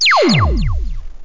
falling

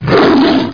attack; Hexen II; mezzo

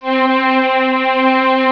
STR ENS; Dark Forces Instruments

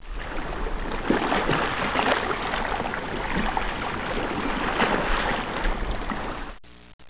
stream

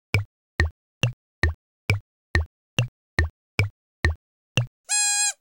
PINGPONG; Silcom; ZELVY

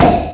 punch; BANZAI

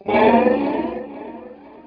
growl; robotron

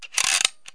DART LOAD; HOUSE; FUN

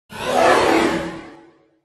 woosh; WMBonusXP

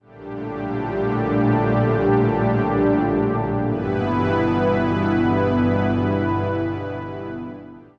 PAD; greatkazoo

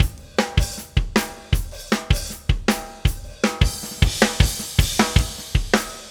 Power Metal, Rock Drums; ACID MUSIC

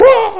wah; Sink or Swim

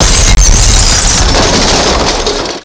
bang

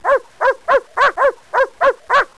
dog barking; Tessellation Exploration Slide

